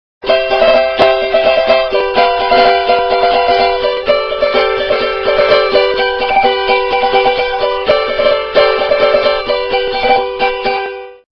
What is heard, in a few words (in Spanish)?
cavaquin; tradiberia